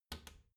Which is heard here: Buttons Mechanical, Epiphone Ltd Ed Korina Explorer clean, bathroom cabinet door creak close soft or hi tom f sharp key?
bathroom cabinet door creak close soft